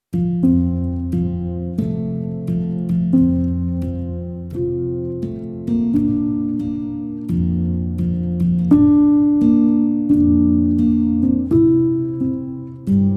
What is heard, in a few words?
Instrumental; Chickadees; Cape Meares